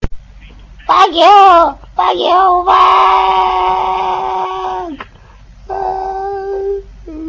Screaming; Idiot

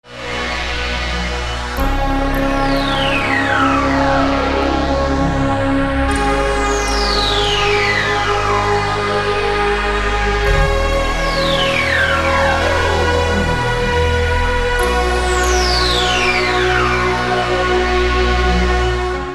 Hymne Sound

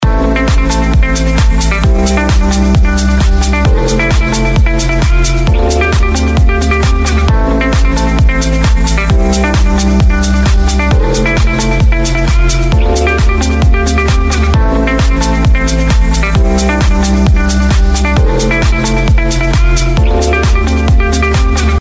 Dolphin Music

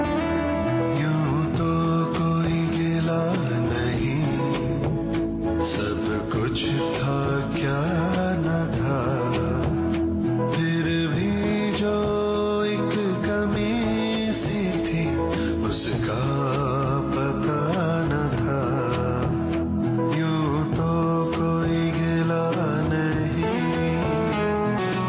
Gazal

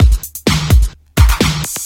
Breakbeat Msg

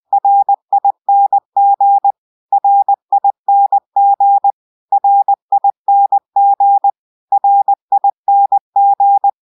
Morse Code Ring

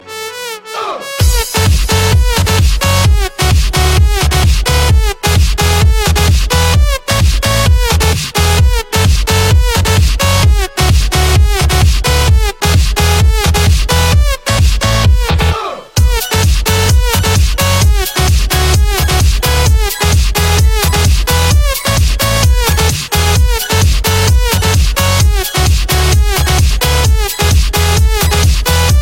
Dirty Electro

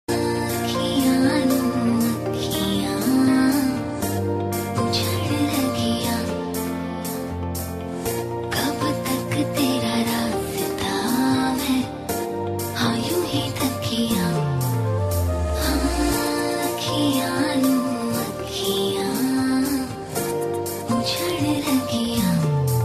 Slow Romantic Songs

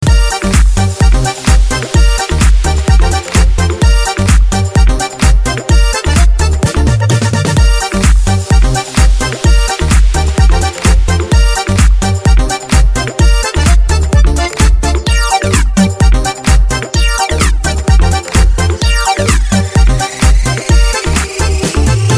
Ring Eco Cool

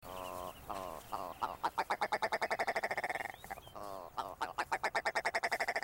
Bird Sound

